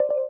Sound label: Error; Hey Cortana